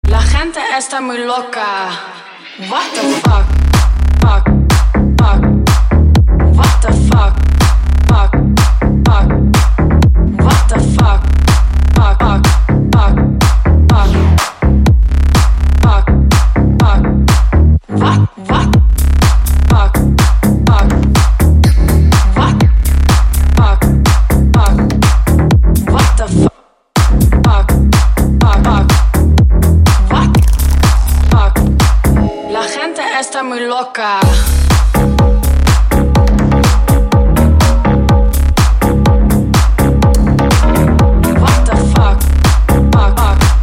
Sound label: whoosh extended mix